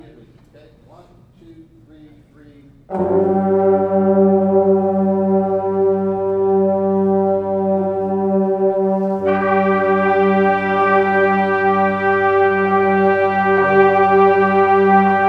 Chord Progression, brass

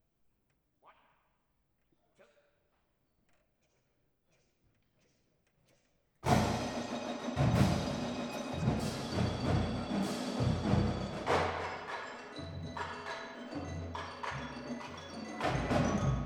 Drum Solo

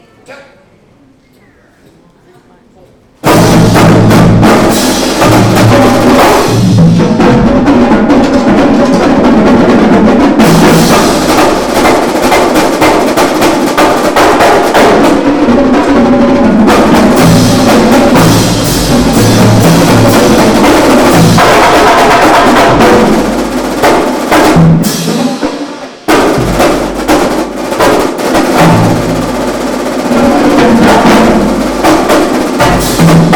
Drum Solo; north star buglers hall of fame 2018

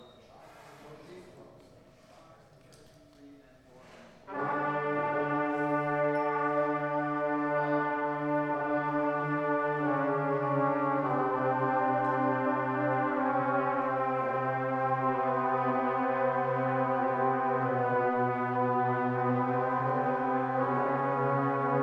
Warm Down, brass; brass practice

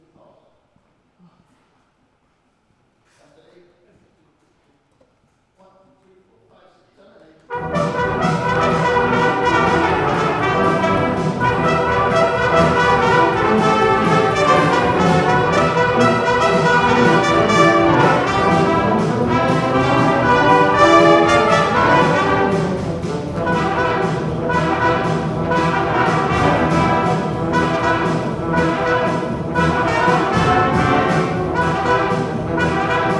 Cantina, brass; north star brass

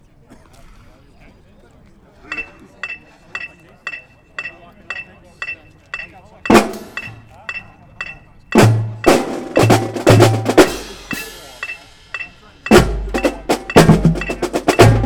Percussion Intro; ensemble practice lynn ma

